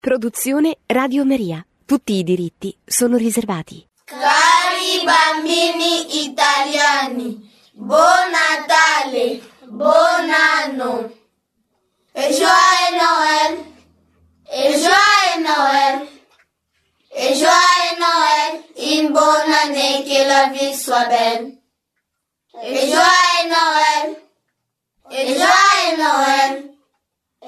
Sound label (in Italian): BAMBINI CONGOLESI